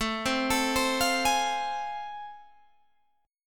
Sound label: A Minor Major 7th Sharp 5th, Guitar Open G